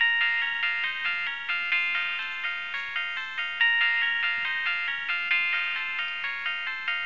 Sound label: nokia 3510i nocturne ringtone